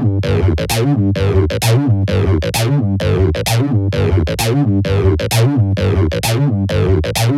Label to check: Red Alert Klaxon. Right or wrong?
Wrong